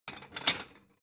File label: plate small take hard